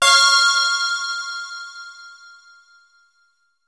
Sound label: synth bell; AKAI S6000 CD-ROM - Volume 5